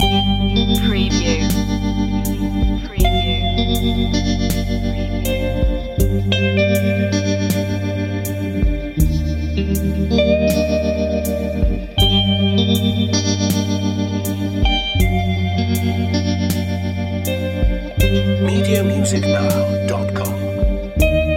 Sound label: spooky guitar pick loop; Soundtrack / Dark / Emotive